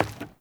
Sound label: Grunt Step Wood, footsteps; Enemy; Corrupted Sproutfolk